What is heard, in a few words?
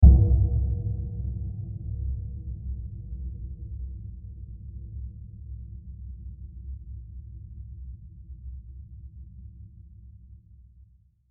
Long Diffuse Impact; Low Impact; Impacts; Sound Effects:Impacts; CraftedSignals